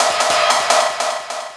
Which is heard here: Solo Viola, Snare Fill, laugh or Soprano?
Snare Fill